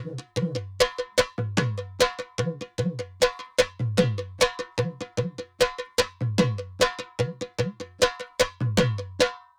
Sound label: Rohedoi Dhul Loop BPM 100; Bihu; waves of bihu vol 2; Gully Loops